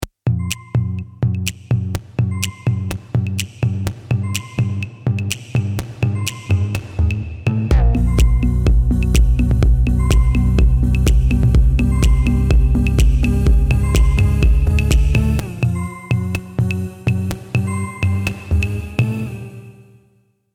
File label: minimal techno